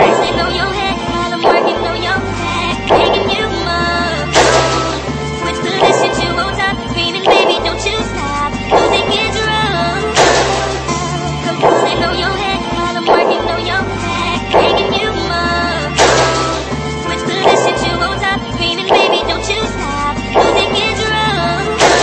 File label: Moan